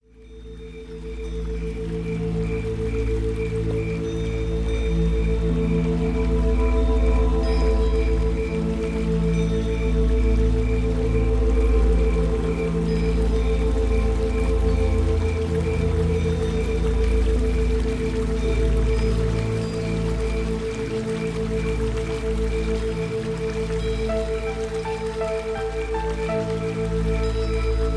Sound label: Deep Meditation